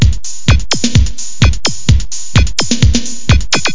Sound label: techno; Sounds Terrific II